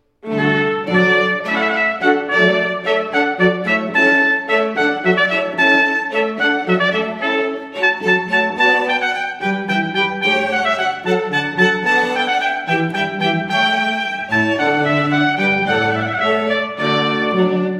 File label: Hornpipe, string quartet